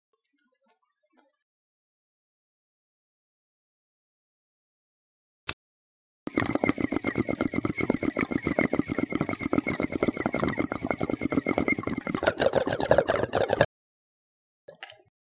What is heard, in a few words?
bong in use